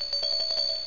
JINGLE

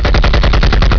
MECHWEA; 枪炮声音素材

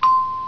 BELL

